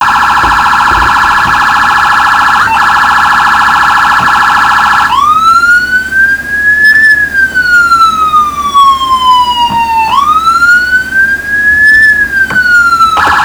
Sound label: police